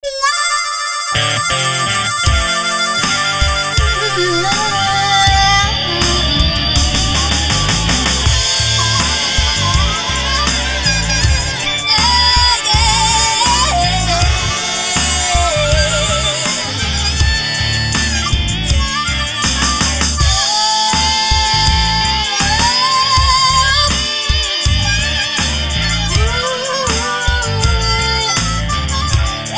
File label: VOCALS, WITH TAG